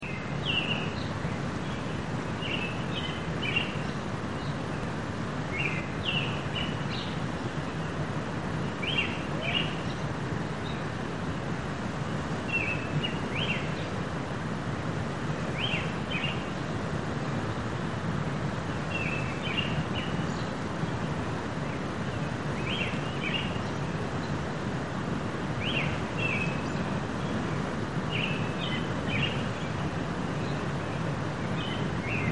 early morning birds, campsite, Rocky Mountain National Park; andrews creek